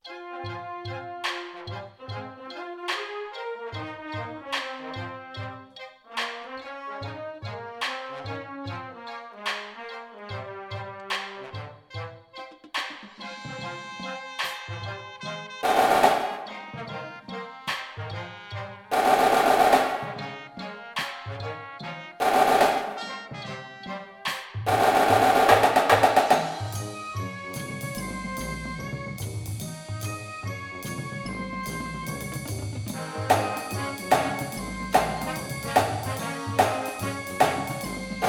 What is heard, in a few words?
snare with music